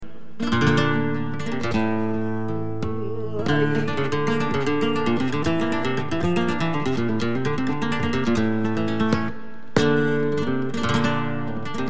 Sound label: flamenco quart gang